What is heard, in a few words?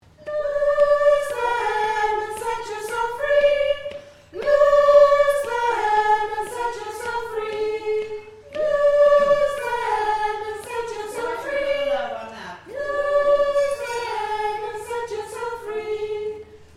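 soprano, singers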